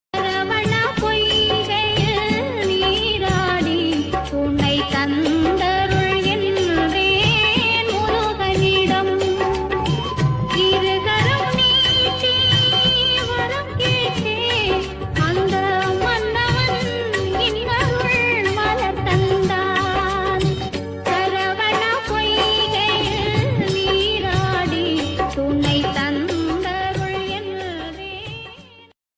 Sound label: tamil murugan ringtone